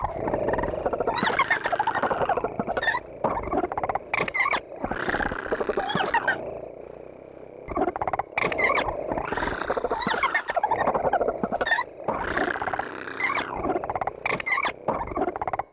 Beastlings Sing

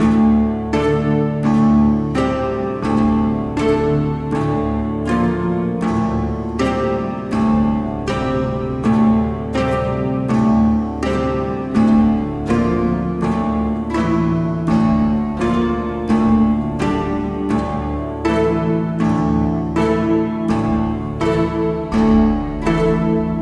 harps main melody; Peak; needolin

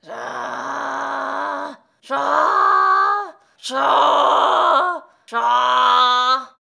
Stilkin Trapper Sing

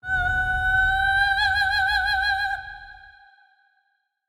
Sing; Skarrsinger Karmelita

